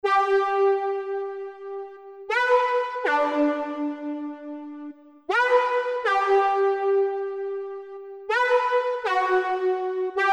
back melody

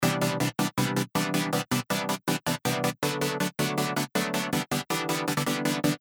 Chords Layer

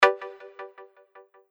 2nd Chord

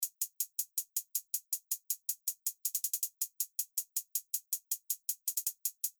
HiHats

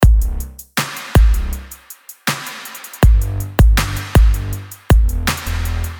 Bass + Beat

